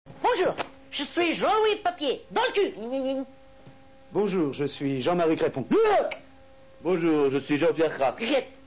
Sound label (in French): Tics